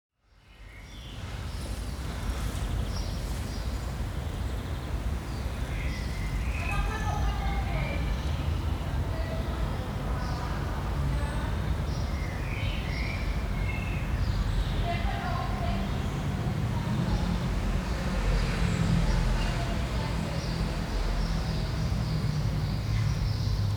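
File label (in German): Klangnische, footsteps, and the ringing of the Jesuit Church bell shape the soundscape, creating an idyllic atmosphere; Soundwalk; directing attention to the present moment; A Soundwalk for Klangspuren Festival 2025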